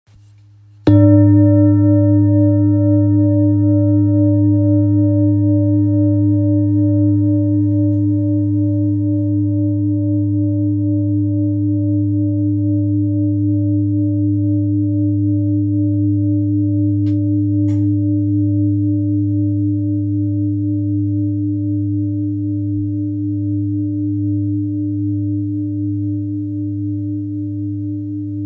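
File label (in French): Bol gravure arbre de vie sol, bols chantants tibétains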